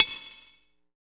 METAL VRB AGE, drums; R+B KITS2

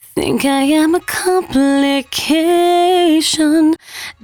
113bpm C#, Velvet Vocal; Zero-G

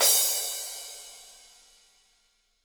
HiHat & Crash, Roland TD-20; HiHats

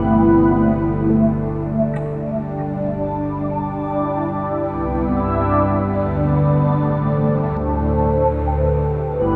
Billowing, Pads; Sonic Foundry (Sony Creative Software); Synchro Funk

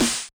Yamaha DJX 2B, SD T9 Dist H

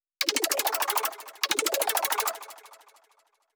contemporary DnB arp; lead arp chord melody

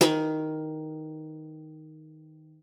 D#3, guitar; Xsample VOL-2

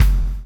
CHAIN; Spectrasonics - Backbeat

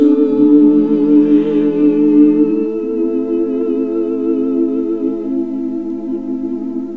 SING, CLASSIC VOX; Best Service; Hallelujah

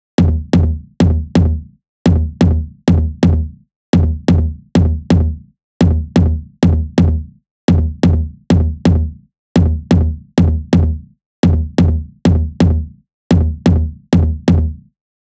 Tom, Drum Loop 128BPM; VEC5 Drumloops; VEC5 Multi Loops 128BPM; Loop Elements

